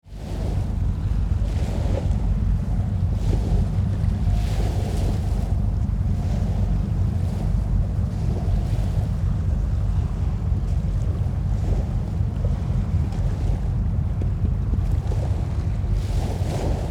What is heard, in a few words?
container ship engine bass